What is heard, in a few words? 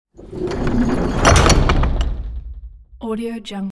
object medieval door metal close; domestic sounds